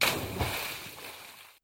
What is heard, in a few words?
Water Collision